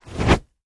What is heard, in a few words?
Fire Fast Torch By; torches